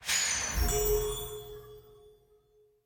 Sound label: Nightmare-Magic; super mombo quest